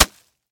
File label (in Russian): korotkij bistrij udar nozhom